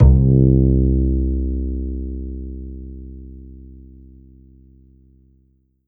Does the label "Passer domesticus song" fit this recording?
No